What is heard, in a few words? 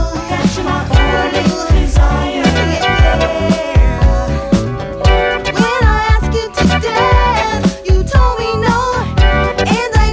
Lower Vocal Mix